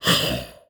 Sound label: Animal Mammal Carnivore Cat Lynx Hiss; animals; AdobeCC